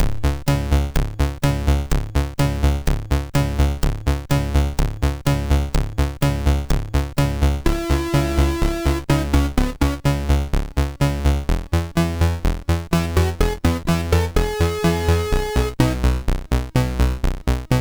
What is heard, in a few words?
Wind Theme, MOS6581R3